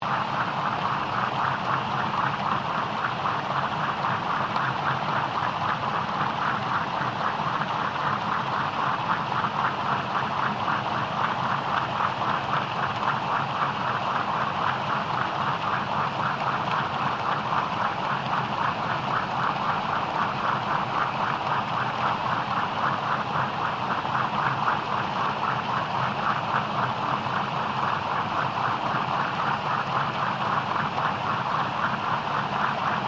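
Boat Custom Restaurant Boat Large Engine Submerged; Resteraunt; Boats (Sound Effects:Vehicles)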